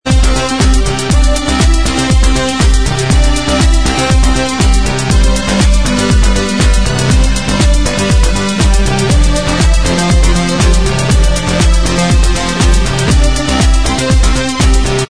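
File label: dance remix instrumental track, backing tracks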